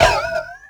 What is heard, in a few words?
Cans, Collisions; Decors